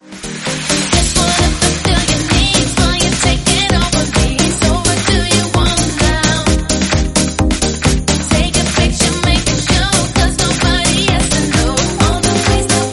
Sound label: Pumped Redrum 130bpm Cm